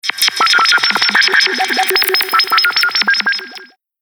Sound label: Science Fiction Digital Communication Sounds; Andrew Fleming